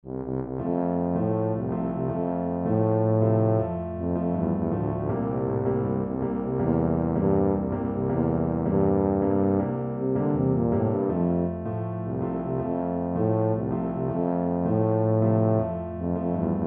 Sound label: la cucaracha, tuba